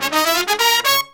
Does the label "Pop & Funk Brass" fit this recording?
Yes